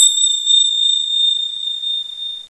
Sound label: Tibetan Bell; Indiana Jones Demo; iMuse